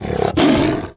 roar

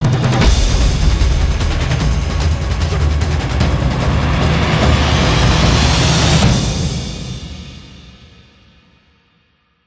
Chain Ride

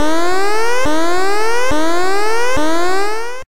Emergency

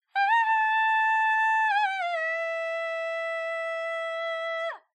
Kulning

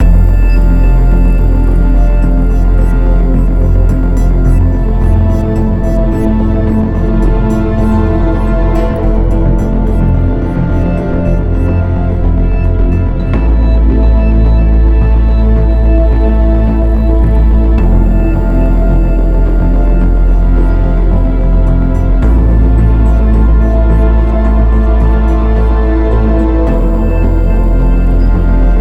Tense; Everspace 2

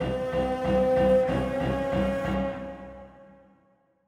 Strings Outtro; Goblins Stems; Death Master; song of iron

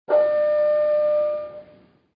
Announce Sound; Extra Lives

